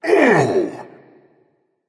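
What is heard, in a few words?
non verbal ouch, voice; cernunnos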